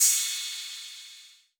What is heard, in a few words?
Dimension Crash; trap; Cymbals; Cymatics; Trap Starter Pack